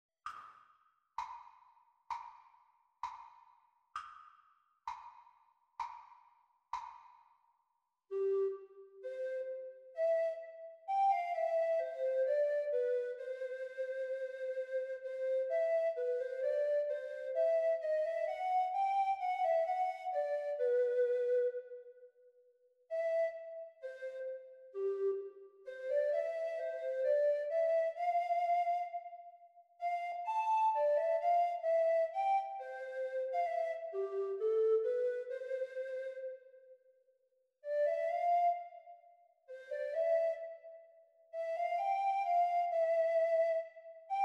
Treble Recorder; Grade 6; practise speed

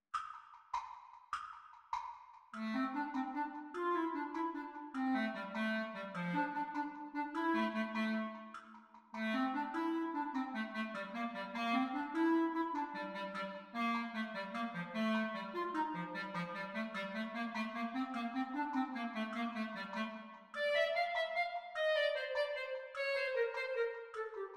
Exam Speed Recording Clarinet Duets; Grade 7

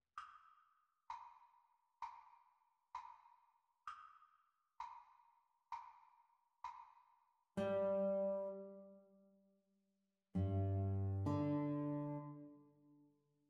Guitar Duet Playalong Practice Speed; The Teacher part

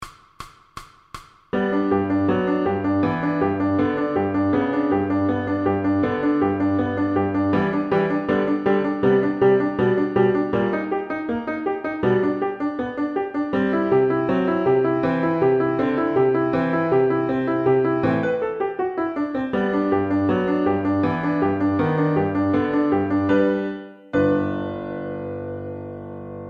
VLN Pizzicato Arco 160; Pre-Grade Higher; piano accompaniments

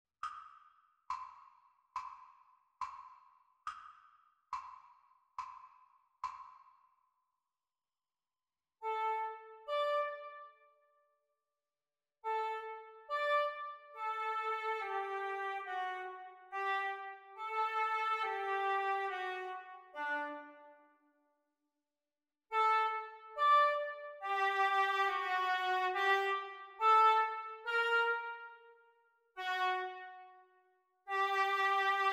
oboe duets practice; practise speed

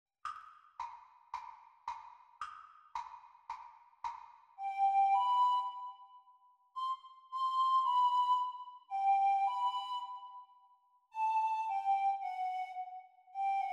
Descant Recorder Performance speed

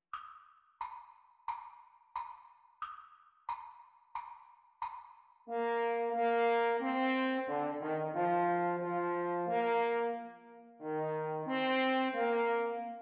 French Horn Duets Playalong

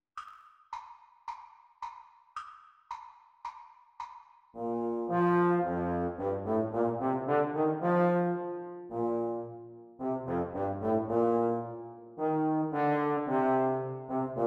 Playalong for Trombone, Speed; Exam Speed

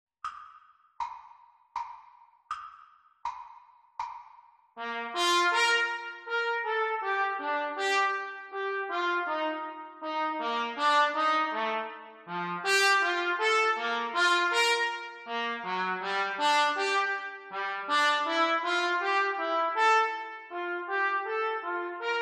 Trumpet Duets Exam Speed; Grade 8; Cornet Duets

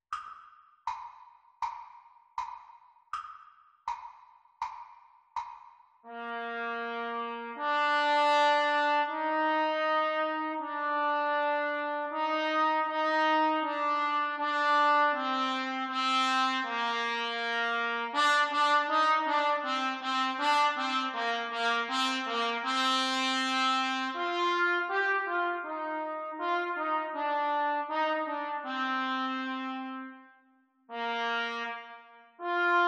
Trumpet Duets; Grade 1.2; Exam Speed; Cornet Duets

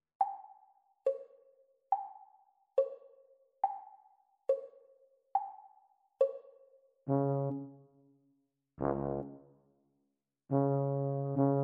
Eb tuba duet practice speed; Grade 5

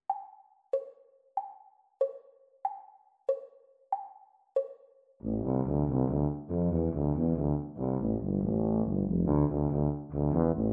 Bb Tuba Duet exam speed; Grade 7

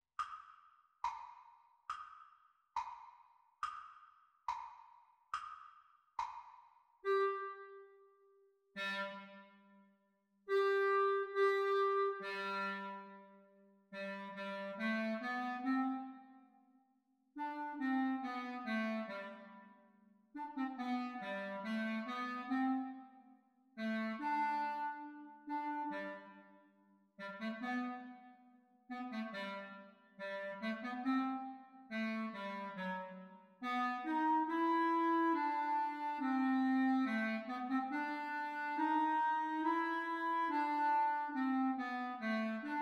Practice speed Recording Clarinet Duets

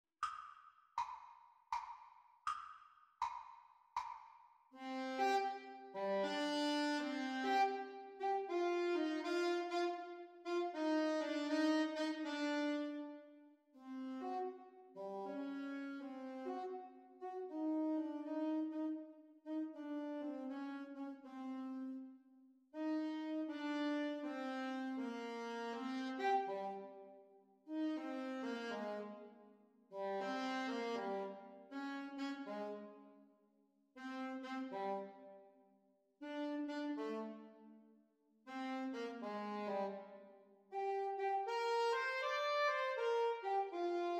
Sax Duets Playalong; Grade 6; Practice Speed

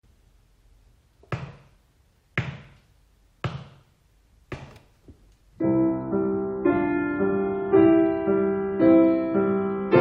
Piano Duet practice speed; Grade 2; teacher parts

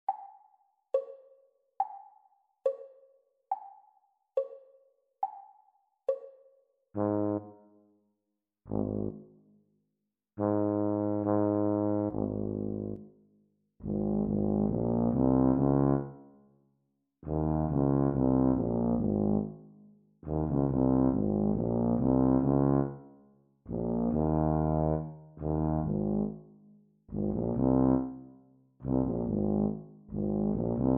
Bb Tuba Duet practice speed; Grade 5

